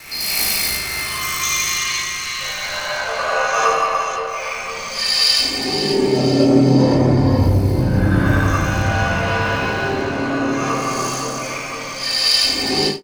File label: syn, Chillout Synth Atmos; Best Service ProSamples vol.36 - Chillout